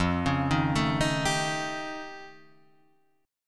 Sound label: F Augmented 9th F